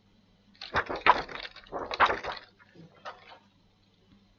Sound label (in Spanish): Placófono Suave Grave pp; Placófono1